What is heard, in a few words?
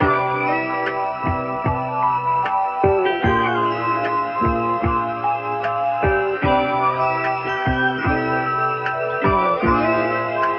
keys and guitar, Phonky loops